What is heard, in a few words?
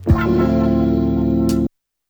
SP303 short phrase; SP303 Bank A